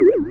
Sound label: woo woo; Creative Review CD-ROM 39